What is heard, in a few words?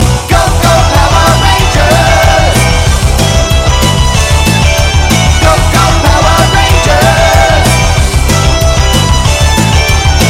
Trap; Mighty Morphin